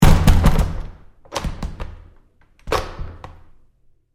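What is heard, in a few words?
Door Bathroom Stall Close close-up Med with Lock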